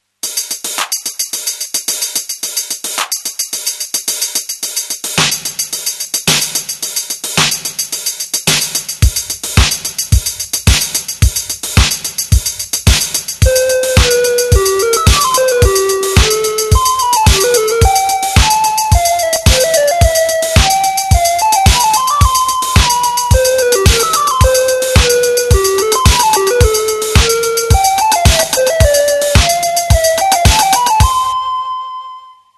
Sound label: casio VL1 tr-707